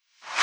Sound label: FX Reverse; VEC5 Multi Loops